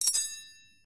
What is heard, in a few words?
achievement unlock